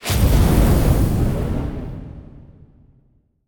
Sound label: fire large start; rise of maya